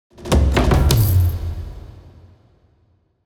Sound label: Drum Fills Bastaki 103